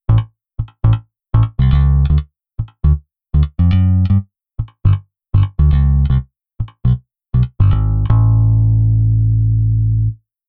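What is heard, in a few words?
bass staccato legato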